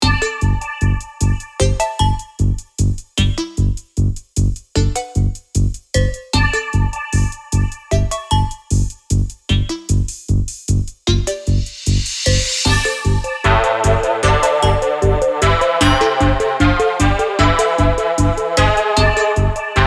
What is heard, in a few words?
TOWER